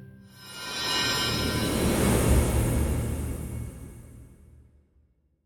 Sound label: near win; wingsofriches